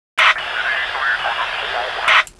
radio use, weapons

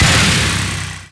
minigun wind down

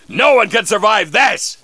MALE MELEE ATTACK; combatarms2

